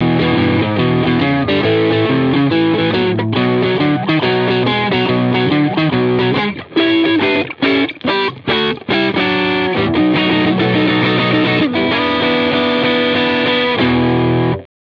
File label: Overdrive